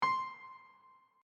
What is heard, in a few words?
start; kitchen ios